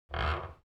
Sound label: knocking on wood with jungle ambience